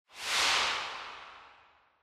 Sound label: Camera Motion Mid Reverb; シーン・カメラモーション